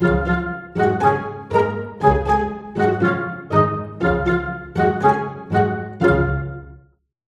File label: Minuet, iPod sounds; i OS